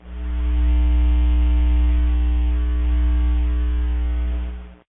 machine

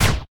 bullet impact

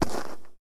sand, footsteps

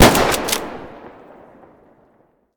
bullet sniper; ufoai; weapons

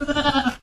goat

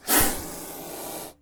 snake cobra

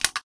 click; ufoai

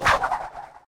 melee throw; ufoai; weapons

